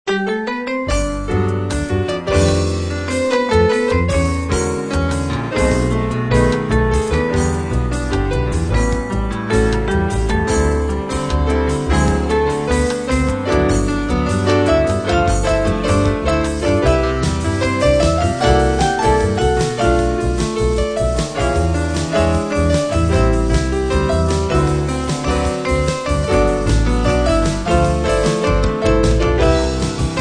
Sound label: Jazz